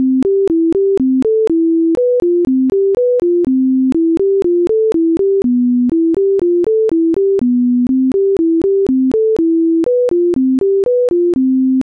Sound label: twinkle harmony